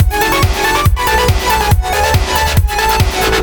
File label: Electro